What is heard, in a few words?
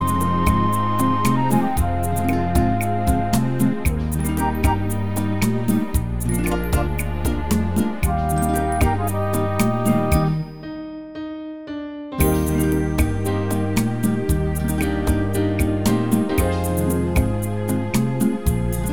beguine